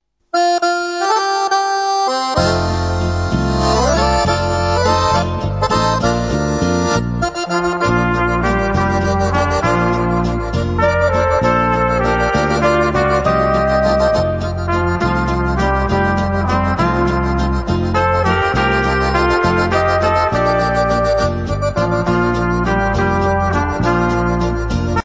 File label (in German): Walzer